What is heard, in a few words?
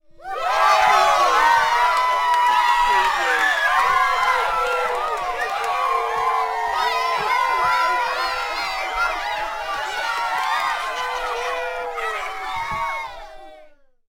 Children Cheering